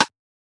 Old Skool House Perc

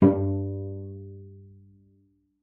G2, CELLO PIZZ; Xsample VOL-5

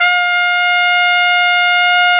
BRS, Tpt; Roland L-CD702

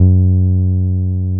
TB-303

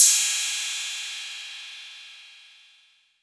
Ride, Roland TR-808

